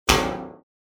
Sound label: Impact Echo Metallic Hit - Short, Metal; Hollywood Edge; sound designer's toolkit v2